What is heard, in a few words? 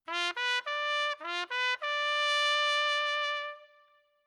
Trumpets